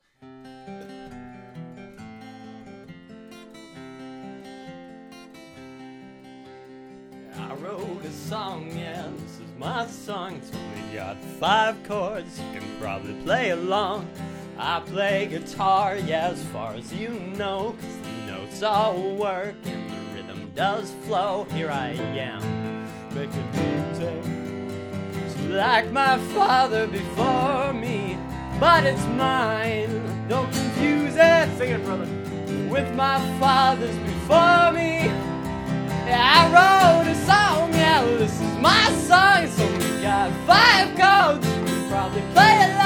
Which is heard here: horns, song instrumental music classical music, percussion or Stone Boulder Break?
horns